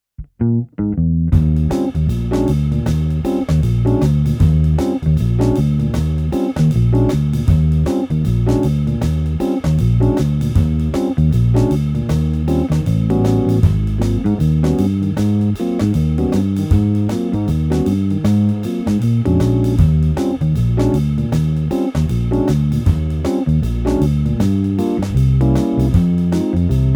Solo; glasgow schools big band

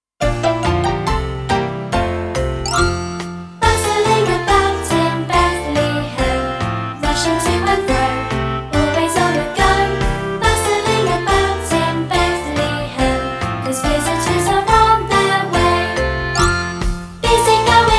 with vocals

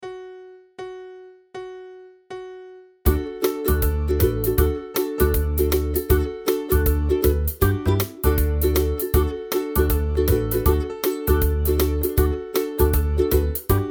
backing; youth music initiative

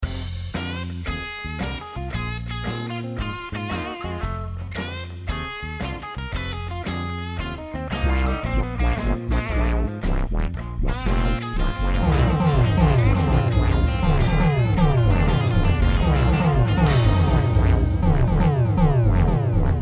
LOOPS

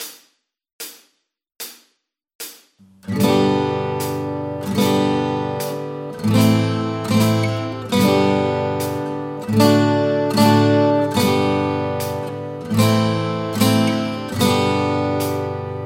Second string exercise

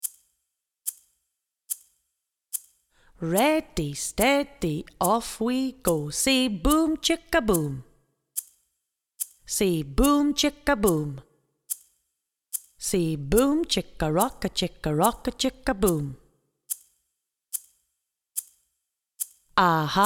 Boom Chicka Boom

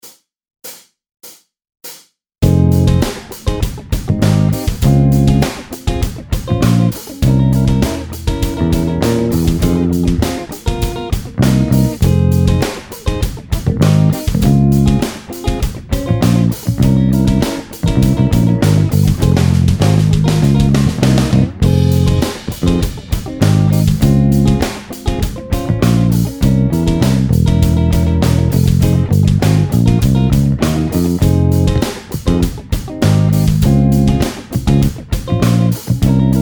Solo Playalong; glasgow schools big band

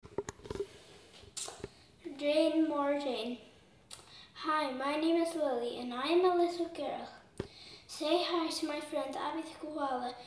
rain more rain

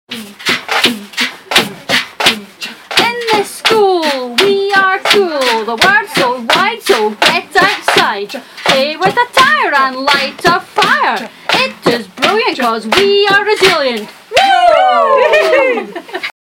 Outdoor rap

